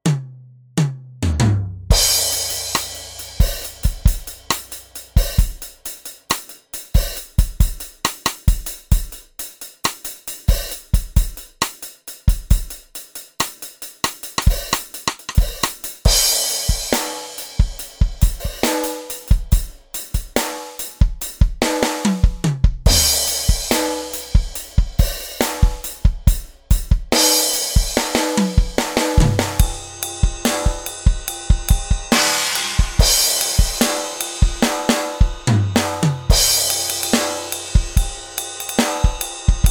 Acoustic Drum Kit; synthesizer; Guess the synthesizer game

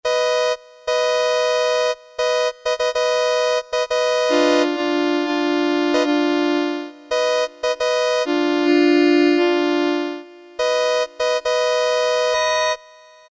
CAR HORN, Casio Rapman; Tones

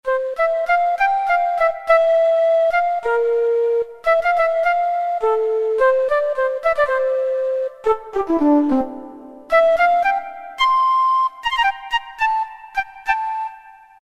Flute 2; Roland MT-32

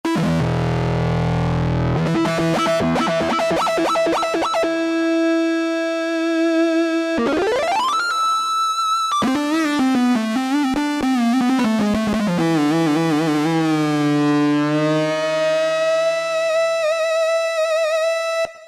Wailing Guitar, Roland JD-990; Factory Patches

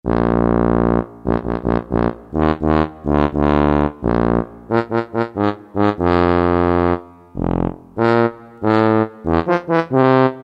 TUBA; Roland SN-U110-06

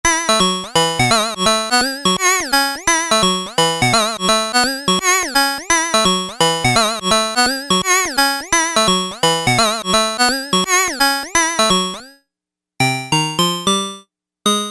Broken Arcade

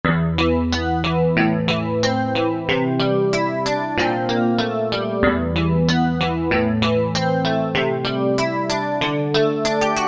E.Guitar 1, Korg M1; Factory preset examples

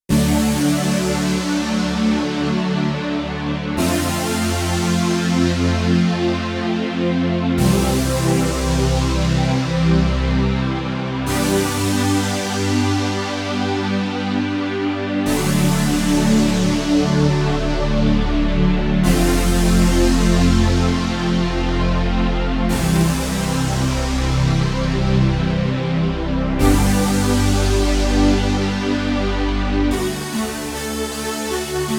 Wide Open Pad

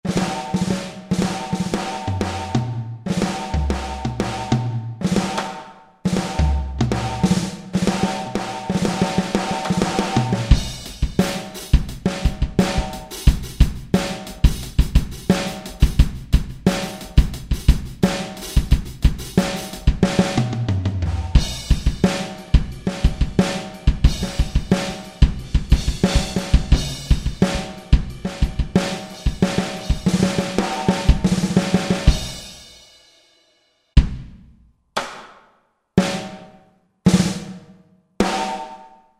Garage KIT; Roland SR-JV80-10 Bass & Drums

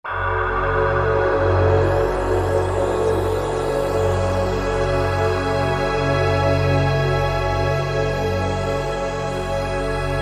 Deep Atmosphere

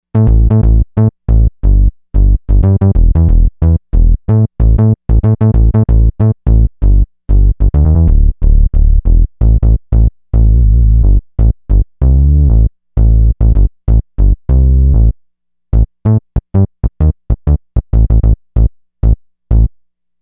Bass

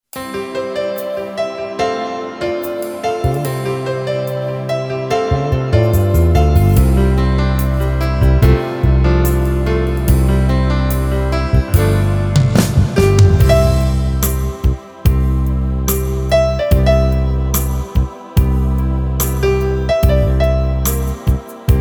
Yamaha PSR-550

